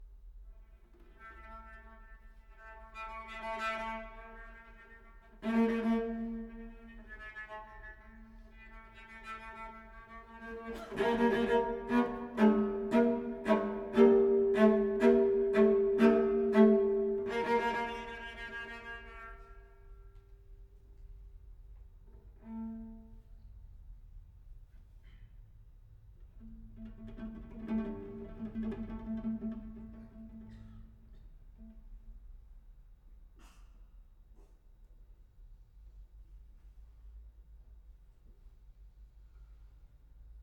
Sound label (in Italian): Sonata per violoncello solo